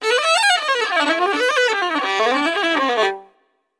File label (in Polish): Skrzypce